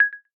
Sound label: audio initiate, ui; Android P; Ringtones